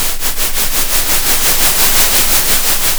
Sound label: center, Sound of a train